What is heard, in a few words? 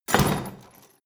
crash medium chain smash